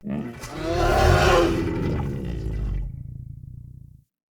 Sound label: Corrupted Battle Dog Yawn, Animals, Vocals